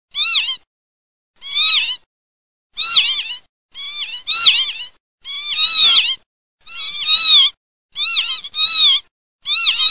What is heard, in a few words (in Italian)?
pavoncelle